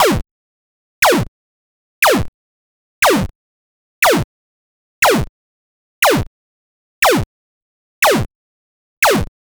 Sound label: pixel bow shoot sound